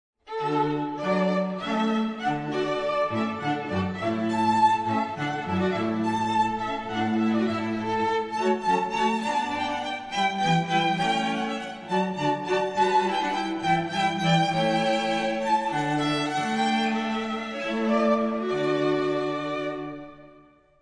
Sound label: Hornpipe, quartet